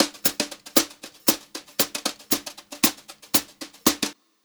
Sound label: Brushed Drum Loops, JAZZY